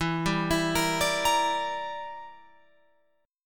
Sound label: E Minor 7th Flat 5th, Guitar, Open F; Em7b5; arpeggiated